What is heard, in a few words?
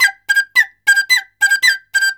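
China flute; Spectrasonics; CHINAFLT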